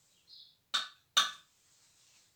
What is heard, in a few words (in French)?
Bruitages bois claves; Percu Bois